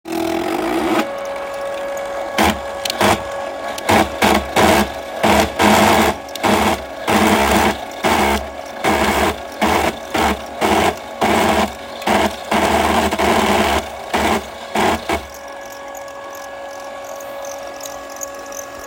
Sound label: Intermittent skipping